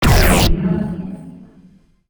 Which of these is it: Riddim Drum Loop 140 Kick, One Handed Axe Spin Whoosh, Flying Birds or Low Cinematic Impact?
Low Cinematic Impact